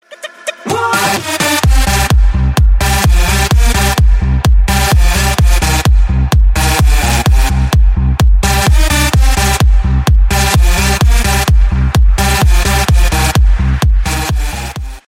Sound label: notifications ringtone; snapchat notification; android